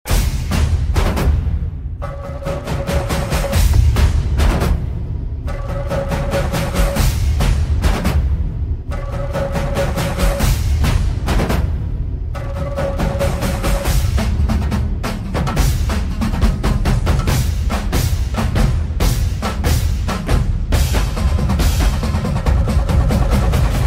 Interval BGM; Garudan

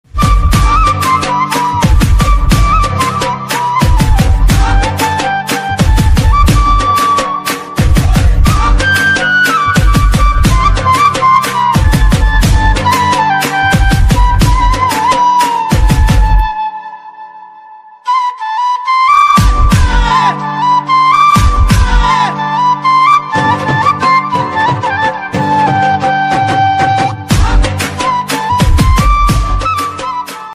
Navratri Instrumental; ringtone

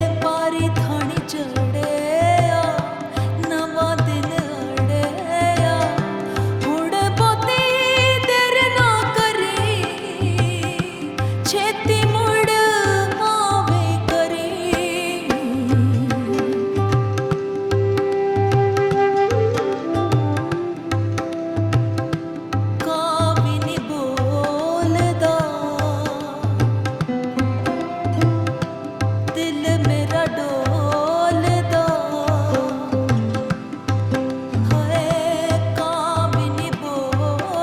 Punjabi Love Song; ringtone

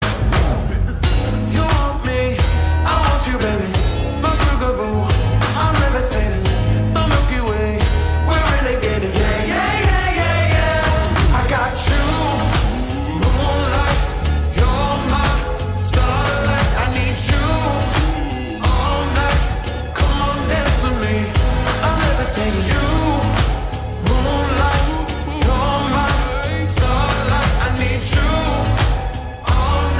slow; ringtone

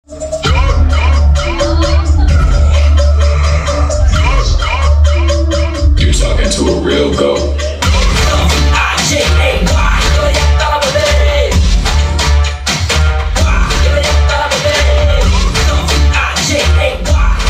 Goat Bgm; ringtone

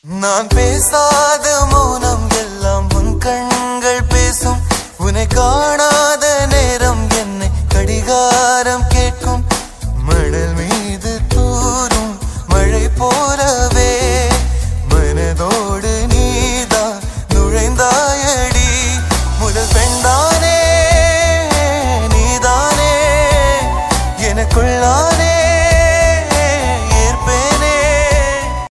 Tamil Song; ringtone; Thegidi

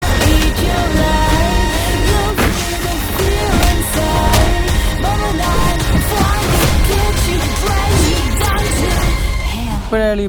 Kondal Bgm

